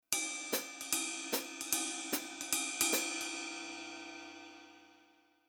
Rhythm 2beat Ride

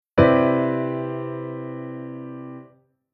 Chord Tension 11

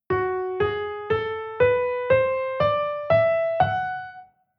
Chord mode Aeolian b5

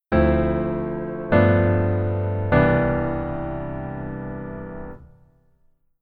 keyboard voicing form

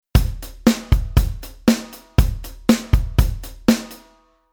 Rhythm

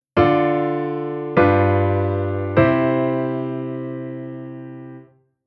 Chord Cadence 251

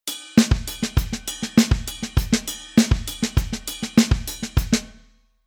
Rhythm Latin

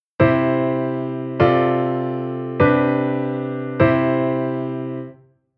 Chord Pedal

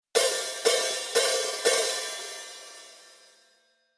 Rhythm Drum Set Basic HH Open

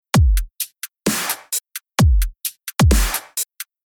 Rhythm DubStep

